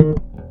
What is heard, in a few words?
bass 3 (D#); Sonic Foundry (Sony Creative Software); Ugly Remnants VOL-2; Basstuff